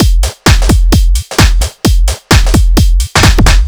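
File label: No Percussion, Drum Loops 130bpm; Zero-G; Soundclash Nu Dance Electro; Acidized Wavs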